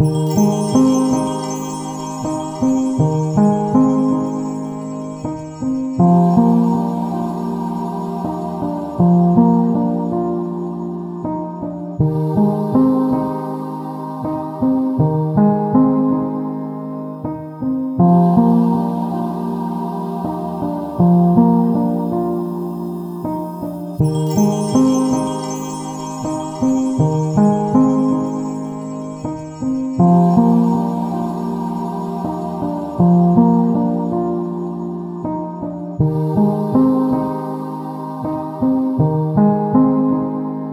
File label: Soothing Soundscape, lofi relux